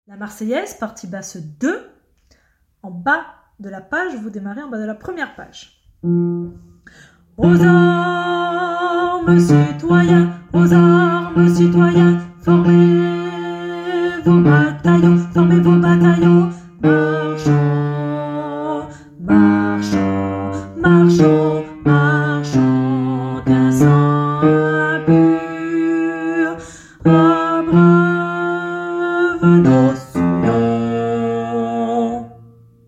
basse

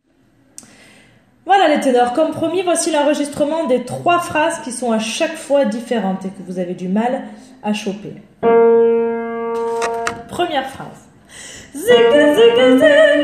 ténor travail 3 phrases; Danse macabre

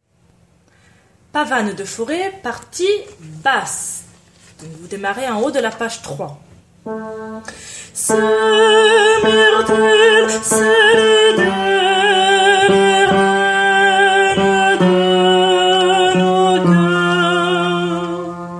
Basse; Pavane; Faure

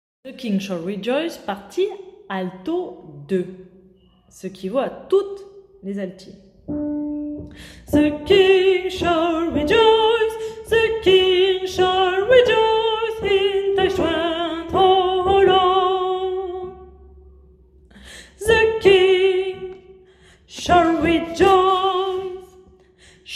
partie alto

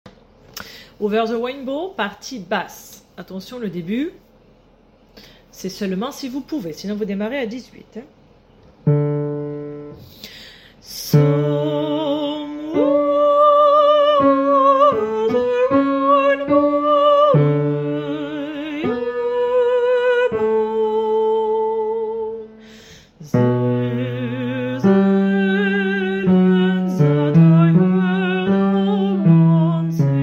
Basse

